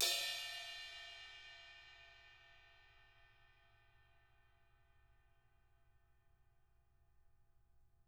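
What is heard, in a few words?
CRASH 18 BELL, CYMBAL; CYMBAL KITS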